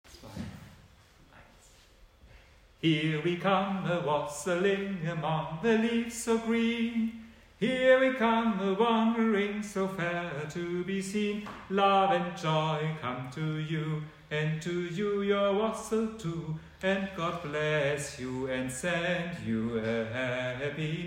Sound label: Bass; Wassail Song